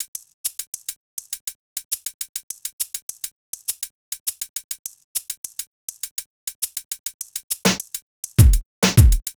Drums, 102 Fmin; Big Fish Audio

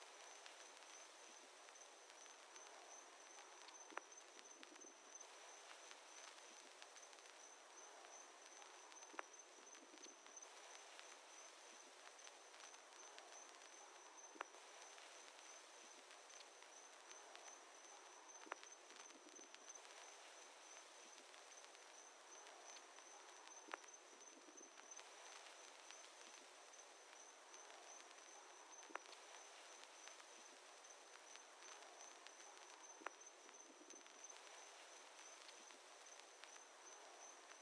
132 BPM F# Min Texture; Cymatics; Destiny Gold Expansion; Afterlife

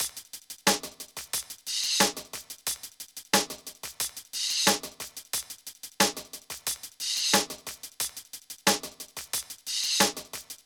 Drums; Hip Hop Philosophy; big fish audio; Apple Loops; 090 Emin

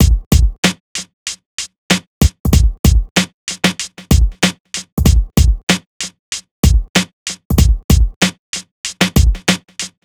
Drums; Hip Hop; 095 Ebmin; Hip Hop Exotica; Apple Loops; Big Fish Audio

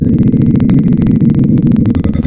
Dog, Animals Wavetables; Cymatics; Serum; Animals for Serum - Gold Edition

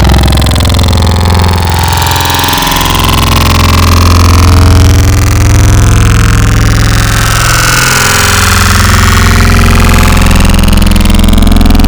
Glitch Modulator, wavetables; Cymatics; Wavetables Collection Vol.2; Serum

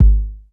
Kick Hit, Bmin; Hip Hop; Hip Hop Exotica; Apple Loops; Big Fish Audio

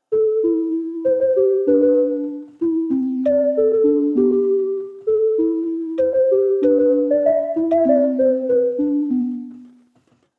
97 BPM A Min Soft Pluck; Cymatics; Destiny Gold Expansion; Kodak

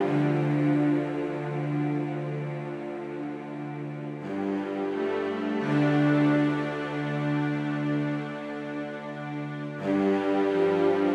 Strings, Hip Hop Exotica, 086 Dmin

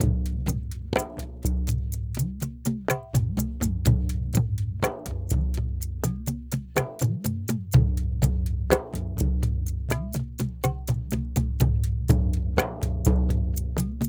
perc mix 062 with duff, Hip Hop India, 062bpm; Hip Hop India Modern Percussion; Apple Loops

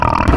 Pig, Animals Wavetables

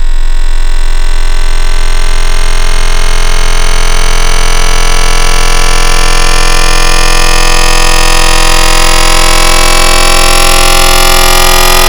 Baby Talk, Wavetables; Cymatics; Secrets Wavetables; Serum

